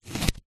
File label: household band aid plaster strip peel from skin close up